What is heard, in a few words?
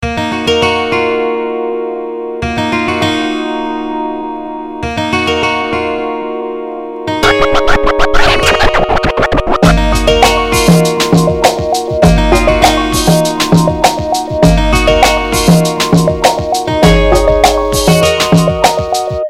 Guitar Scratch